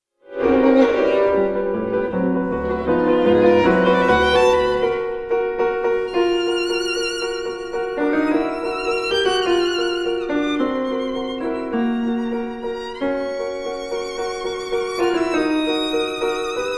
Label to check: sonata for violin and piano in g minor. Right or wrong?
Right